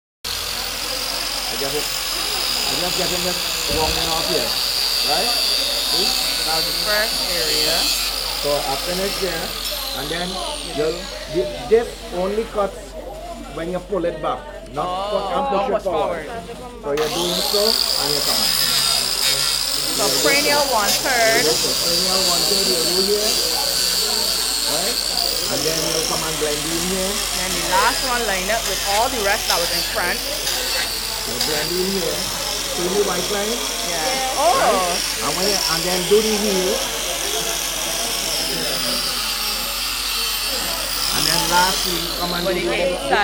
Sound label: trimming hoof with angle grinder